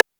small snare

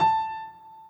piano

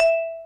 Bell E5; osu

